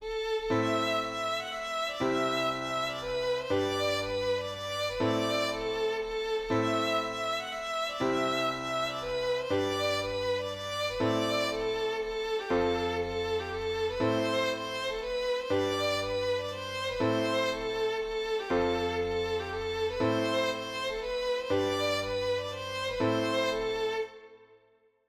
mazurka bretonne

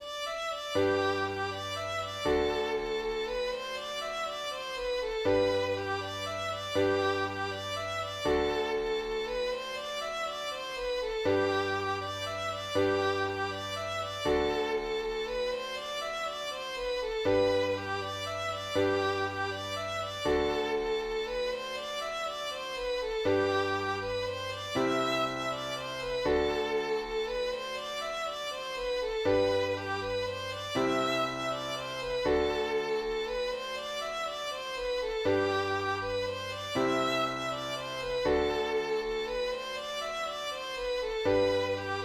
mazurka

